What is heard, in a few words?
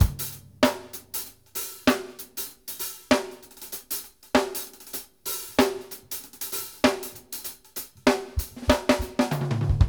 HANDS, GOGO 96; Sampleheads; New York City Drumworks VOL-1